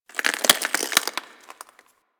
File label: Ocean Glass Crack Small; Warframe; Grineer Ocean